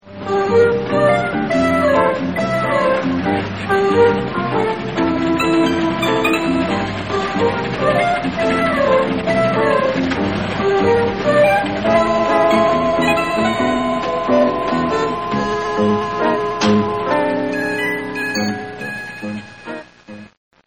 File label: music; Salem